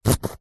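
mayonnaise plastic bottle empty squeeze just air slight squelch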